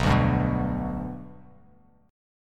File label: B Minor Sharp 5th B Bass Standard, chord; strummed; JGuitar